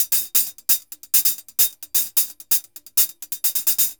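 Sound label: hi-hat, MERENGUE; Best Service ProSamples vol.14; AKAI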